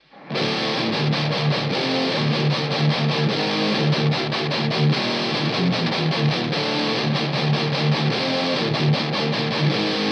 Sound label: high vol high gain double